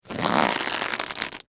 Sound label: Foley Rubber Glove, Scifi, Rubber, Glove, Stretch, Squeak; Science, Fiction; Tech; Blast Wave